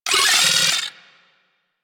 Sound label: Arachnoid Micro Vocal Attack; Warframe; Corpus